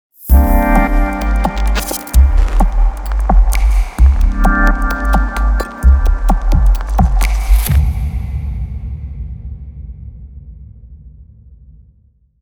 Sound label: Liquid Logo